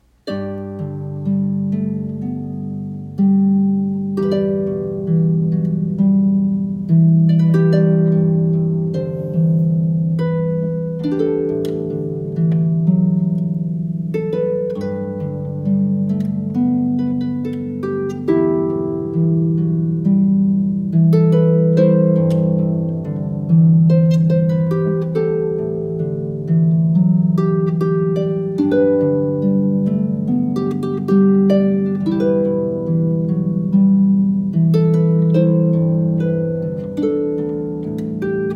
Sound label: harp